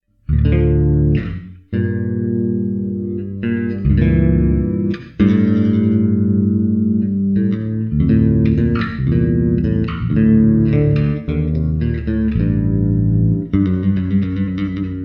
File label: sarabande, bass